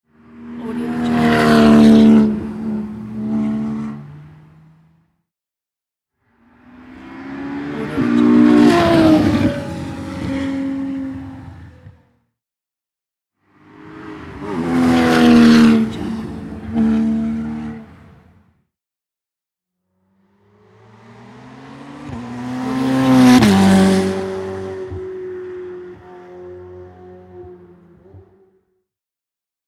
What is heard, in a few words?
race car passing by